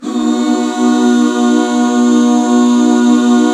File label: B MAJ, Back Chords; Sound & Vision - Gigapack II CD 1